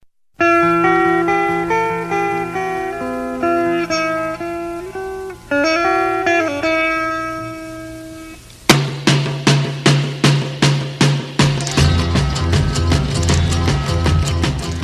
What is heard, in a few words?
INSTRUMENTAL RINGTONE